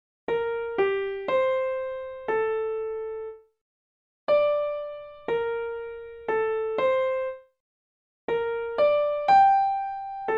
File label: Enigma Theme Pythagorean; Marcel de Velde; Elgar